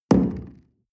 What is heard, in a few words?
coffee place tablecloth medium wobble, restaurant cafe